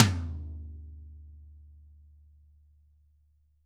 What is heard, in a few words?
DW 14 HIT, Drums; ILIO; Double Platinum Drums 1; DW+TAMA